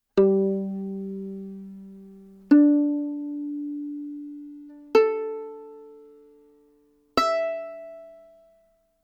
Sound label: tune violin